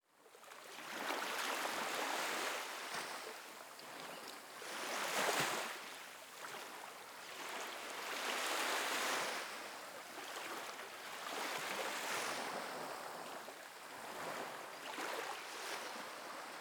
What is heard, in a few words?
Ambience Ocean Shore; AdobeCC